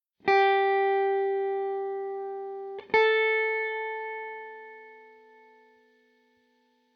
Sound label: G then A, guitar